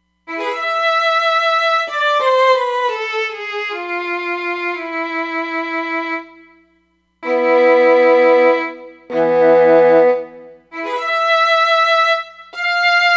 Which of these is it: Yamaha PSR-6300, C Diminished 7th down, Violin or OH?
Violin